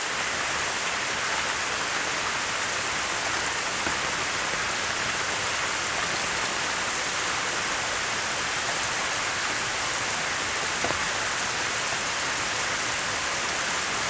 Mild Rain; Rune Factory Frontier

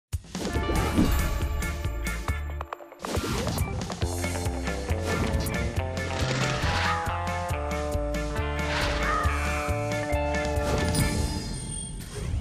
Crow Pack; Disney Infinity

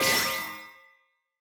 effect loop; fukuro kiekakaru

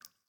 Cave Random Drips; The Inn

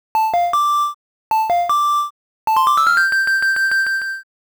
Ocarina, 8bit; Sun's Song

